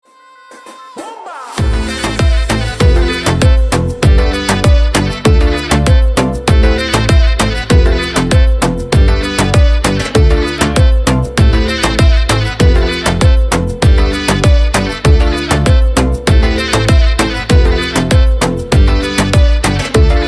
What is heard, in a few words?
sax